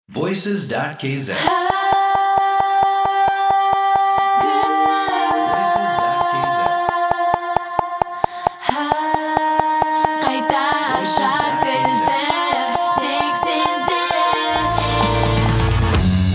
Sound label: vocal